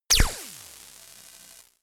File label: laser welder short; krieg-waffen; soundpool